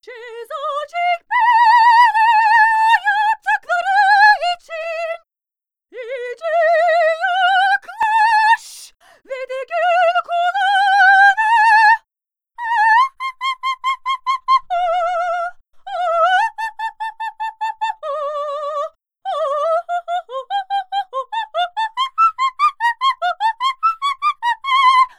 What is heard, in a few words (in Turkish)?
Şarkı